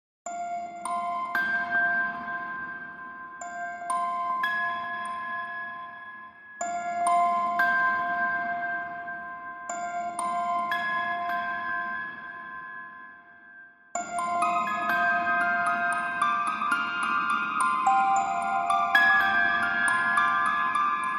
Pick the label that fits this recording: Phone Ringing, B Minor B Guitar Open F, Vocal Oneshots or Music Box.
Music Box